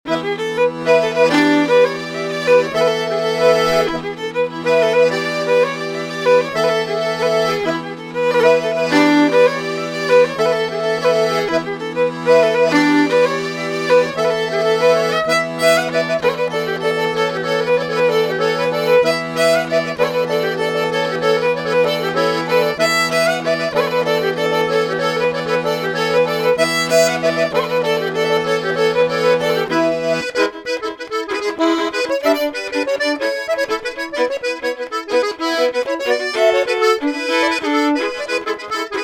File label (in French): cercles, trad auvergne; Grives; St Priest